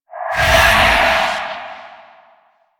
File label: Corrupted Void Ghost Escape Burst; Warframe; Zariman